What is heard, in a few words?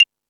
Beat Box; E-MU Pro-Cussion